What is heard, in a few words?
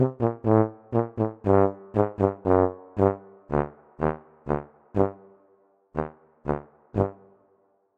French Horn; 120bpm